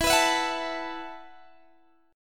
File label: E Suspended 2nd Suspended 4th, Guitar Open D; down